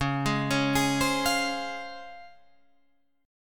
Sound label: C sharp Major 7th C sharp Guitar Drop D